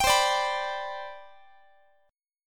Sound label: C Major 7th Suspended 2nd C down Guitar, chord; CM7sus2 strummed